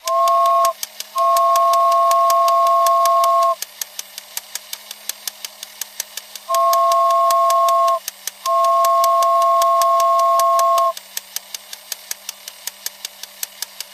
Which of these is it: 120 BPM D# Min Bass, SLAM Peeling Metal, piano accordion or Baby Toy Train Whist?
Baby Toy Train Whist